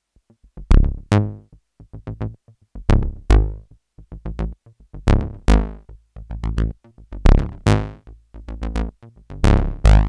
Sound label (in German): Subosc filter noise Aufbau; system8